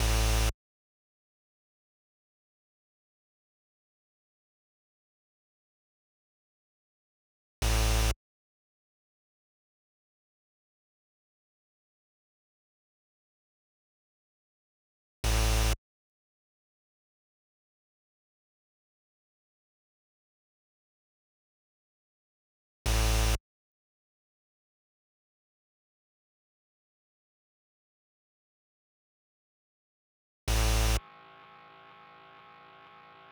Synths Big Bass Intro

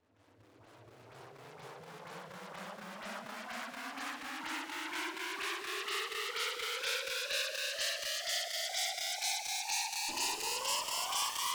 FX Windup

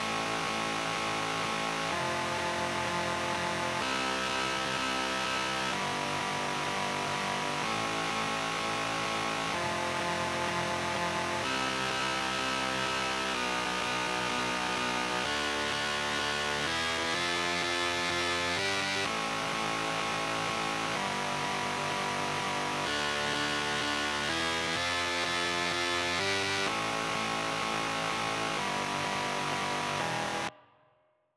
Synths Big Bass Drop

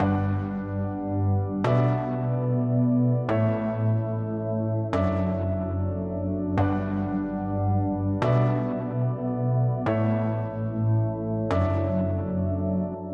Bridge Keys, 146bpm; Remix Stems

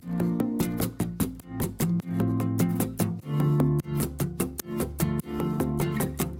Synths

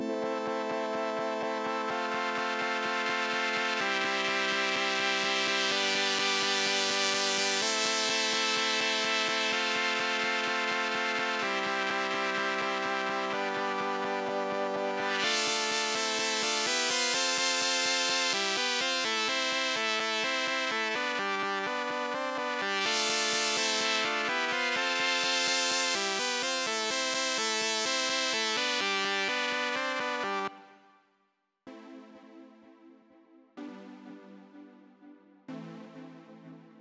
Synths Chords Clean

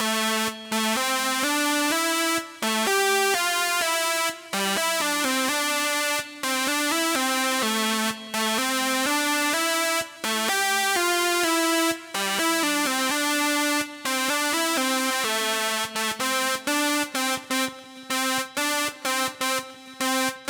Synths Main Riff

